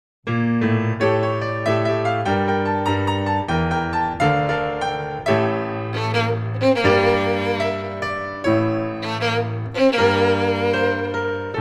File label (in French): violon et piano; IUAV; musique instrumentale